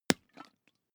hot-water bag punch light

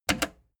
plastic button slack click fast

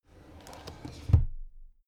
drawer cabinet crammed close soft halfway

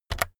keyboard external key press very fast

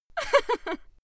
female laugh short

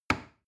plastic button small push heavy

